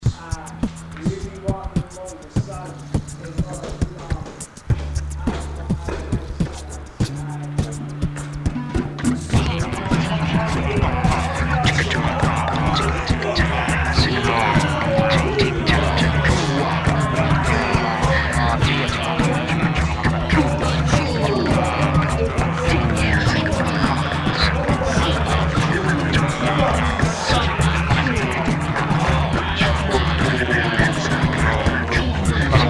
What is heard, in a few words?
Chanter